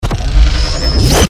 gravity warp; tf2; freak fortress 2; lunar; moon

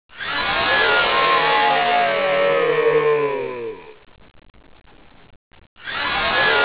die; svencoop; AoMDC

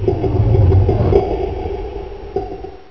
die, monsters, lurker; svencoop; AoMClassic

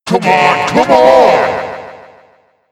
rage alt; freak fortress 2; the madcap

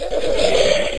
pain, monsters, screamer; svencoop; AoMDC

